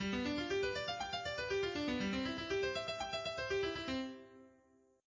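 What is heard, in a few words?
arpeggio up down; orpheus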